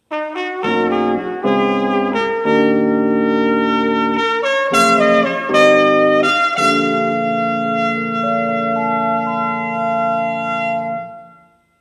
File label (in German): Jingle Rhodes